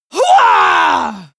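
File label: female combat attack long, screams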